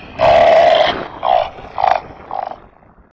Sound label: magic skull; MUDevs S20.1-3